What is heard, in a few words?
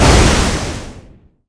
Janitor Shoot; Risk of Rain